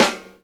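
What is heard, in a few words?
snare drum funk; E-Lab; X Static Goldmine 2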